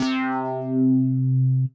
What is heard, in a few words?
Synth C, Synth Prophet One Shots; Electronisounds